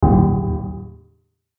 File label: Hit Low Piano; Production Element Imaging Element Accent Transition; Bass Buttons; Blastwave